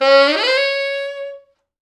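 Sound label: tenor sax runup, C#4; East West; Quantum Leap Horns Sax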